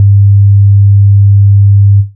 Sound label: sine 100